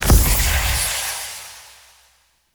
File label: Shadow Smoke Teleport; Warframe; Duviri